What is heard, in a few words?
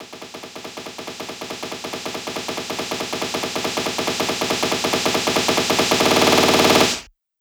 140BPM SNARE 4 Bars; Vengeance Samples; Snares&FX Pairs